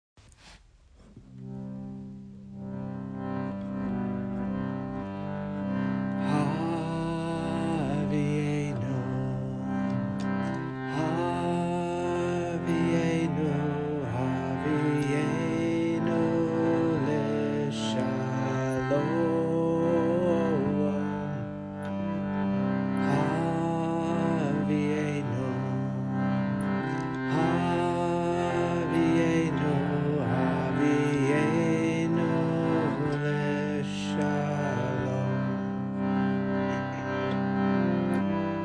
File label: havieinu chant